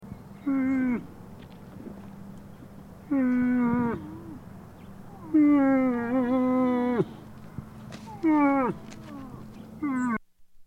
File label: baby moose bleat